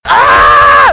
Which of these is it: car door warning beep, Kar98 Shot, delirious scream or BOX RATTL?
delirious scream